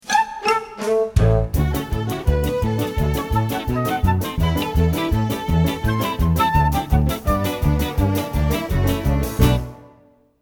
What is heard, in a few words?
Street Transition